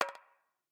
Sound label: menu item click; google santa tracker; android